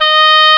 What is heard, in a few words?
OBOE D#3; AKAI S-Series CD-ROM Sound Library VOL-8